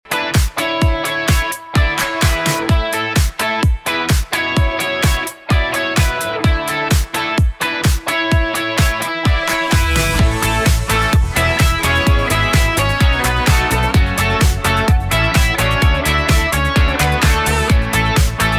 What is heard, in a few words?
intro music